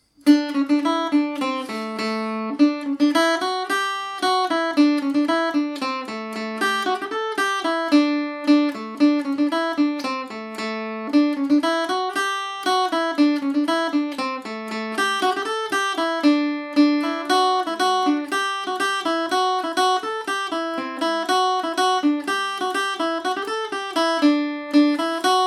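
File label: D major at 40, irish mandolin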